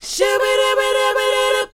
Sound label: SHOO B A#A, R&B & Dance, Vocal; ILIO; Vocal Planet VOL-4; SHOO BE 80